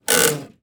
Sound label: footstep wood creak; Aragami